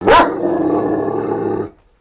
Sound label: dog bark